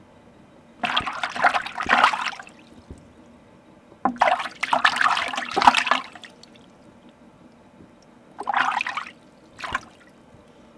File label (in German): WASH ROHMATERIAL